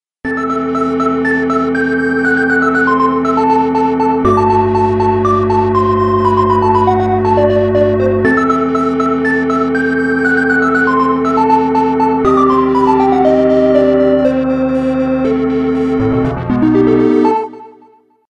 pad built by a squid

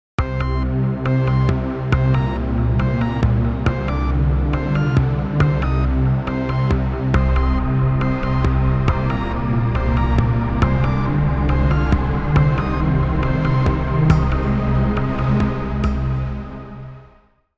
desert pigments

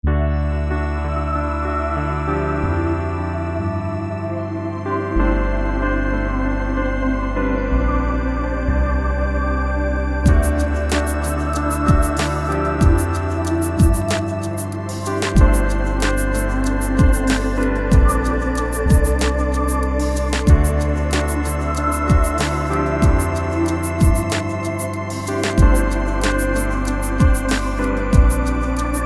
all sounds from polychr

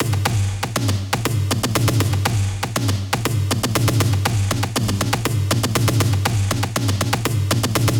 machine tribes loop main hits

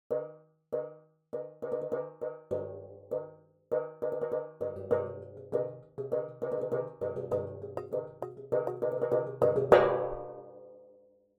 finger example dry

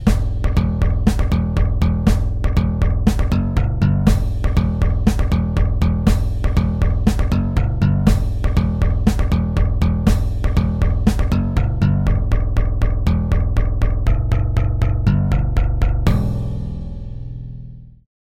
korg 01w xpress bass

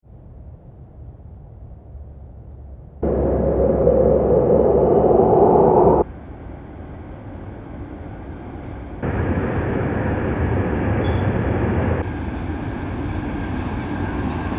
distant train

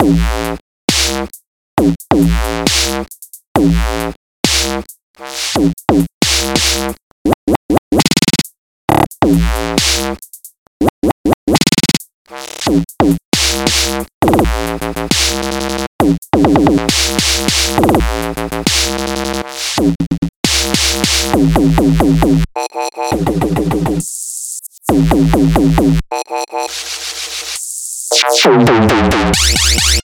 fraction on dubstep beat

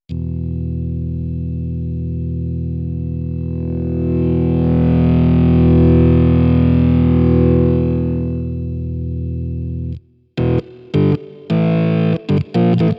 hard rock organ

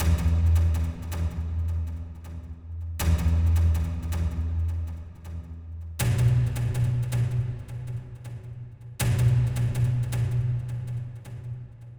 E 160 bpm; battleship

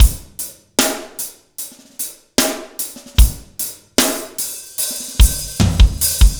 2 bar verse 75 bpm

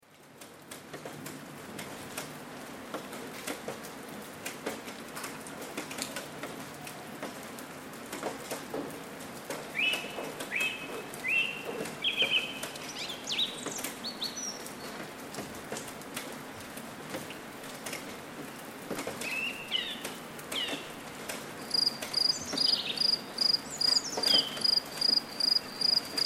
background noise

